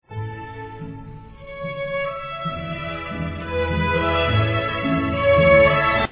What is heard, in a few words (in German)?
walzer